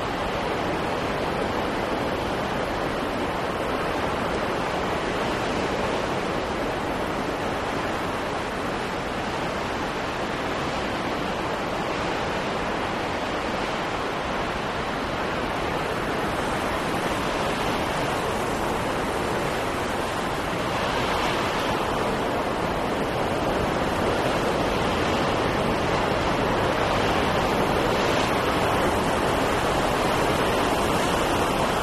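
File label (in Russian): зима сильный зимний ветер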